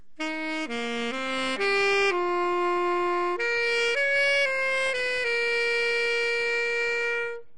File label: altosax